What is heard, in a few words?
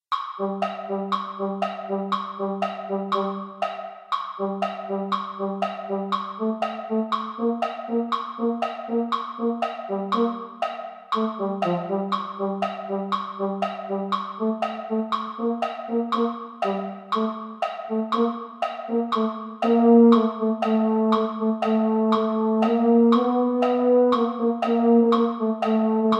cool bells that jingle baritone; midi holiday brass